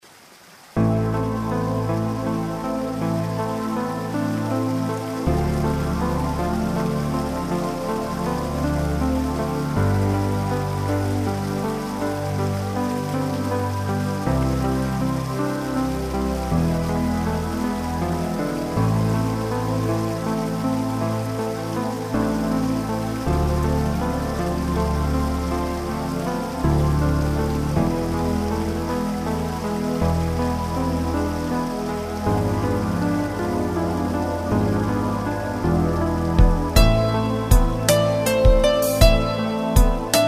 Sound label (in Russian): zvuki prirody ocen krasivaa muzyka